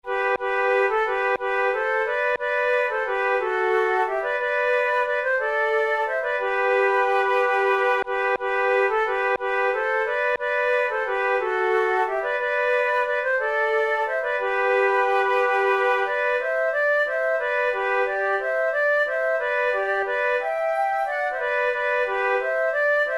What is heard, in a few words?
horn duet; farewell to my harp